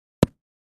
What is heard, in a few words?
Ground Hit Sledge Ham; rocks & dirt